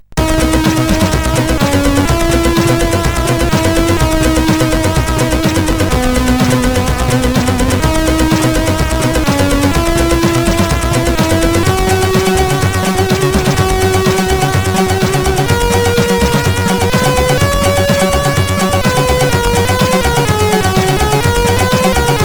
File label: sid CSG8580